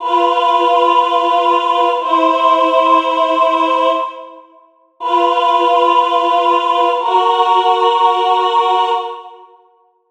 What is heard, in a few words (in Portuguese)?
tritono 2 resolucoes; musicologia